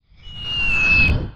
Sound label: artillery incoming